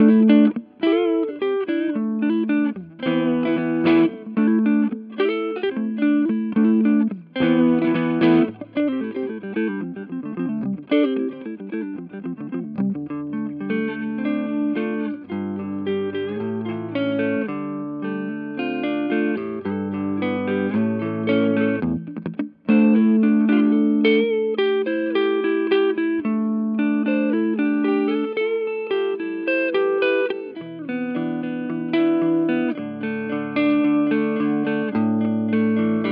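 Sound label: Hagstrom Clean Neck, guitar; Pat Smear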